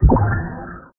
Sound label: abstract particle burst; particles